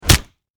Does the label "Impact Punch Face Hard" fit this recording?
Yes